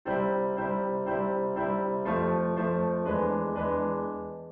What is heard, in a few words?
modulation